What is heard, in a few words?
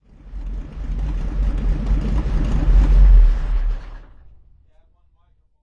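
Cromwell engine interior stop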